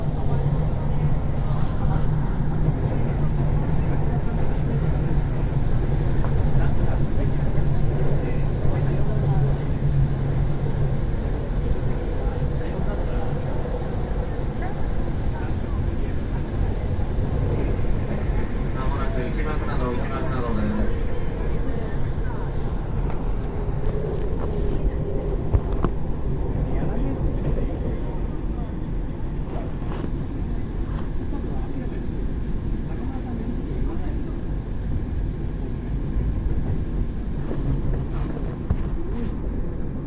on train

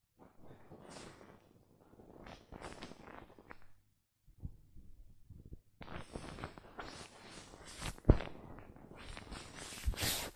rub the quilt; operation

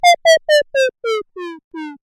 feeble

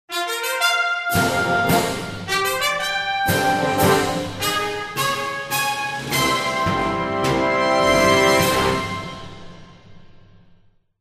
fanfare, jingle

